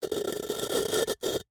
Jar, terracotta, lid, rubbing, scraping, grating, rough, ragged, short; Eiravaein Works; Vaeyan IV